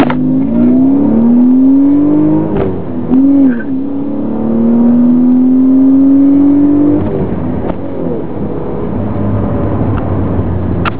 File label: supra accel